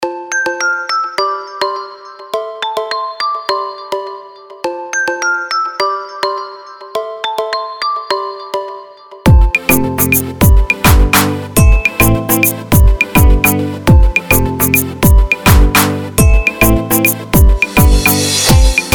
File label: Dancehall